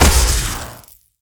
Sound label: Plasmagun Cloth, Damage